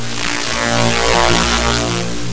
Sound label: Trio Abstrakt basic scale